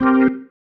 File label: Stab One Shots, Organ